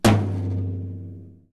tom double

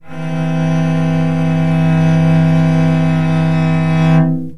minor sixth chord, cello

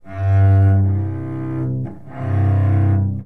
2 notes then chord, cello

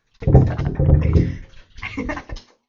giggle and valves, vocals

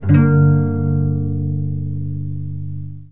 plucked chord, cello

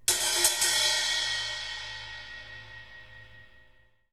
splash cymbol roll, percussion

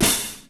biggest cymbol muffled

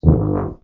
short breathy, tuba

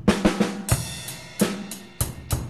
rock beat

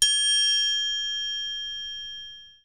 triangle single, percussion

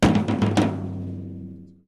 tom roll

